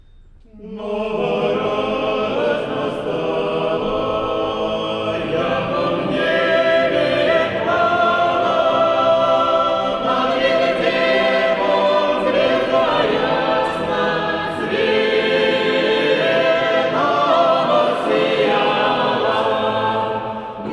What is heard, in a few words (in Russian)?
Ансамбль; Нова радость настала